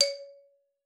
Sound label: C#4, xylophone; Xsample VOL-4